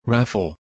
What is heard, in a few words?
laffle